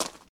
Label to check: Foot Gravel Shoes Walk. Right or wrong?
Right